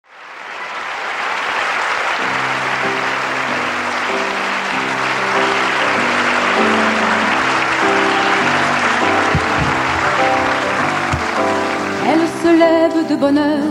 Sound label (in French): chanson